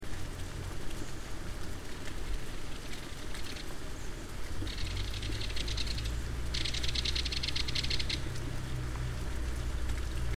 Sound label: belted kingfisher